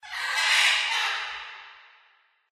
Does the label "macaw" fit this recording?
Yes